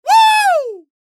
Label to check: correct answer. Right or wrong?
Right